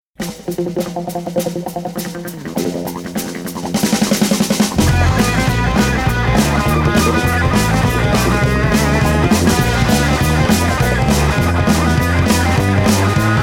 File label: Boogi Woogi